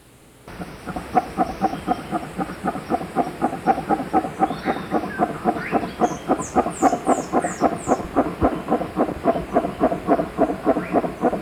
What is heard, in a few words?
brown howler alouatta guariba